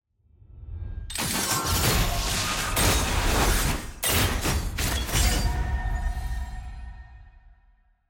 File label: level up; champion mastery